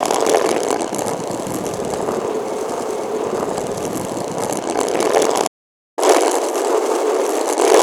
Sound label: Stone Roll; Resynth